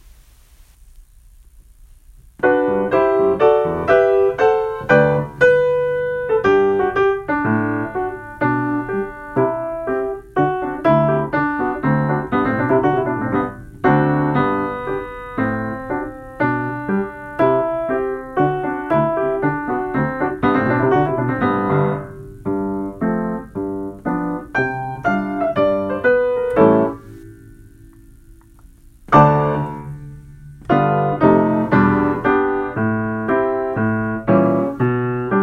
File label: Accompaniment